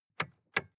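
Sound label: sports car interior lightswitch double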